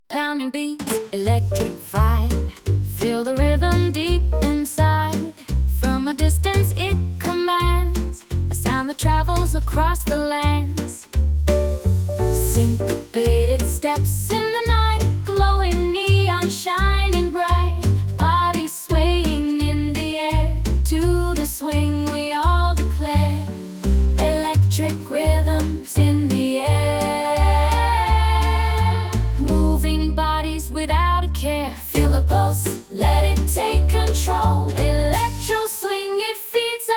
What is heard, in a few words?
Electric Rhythms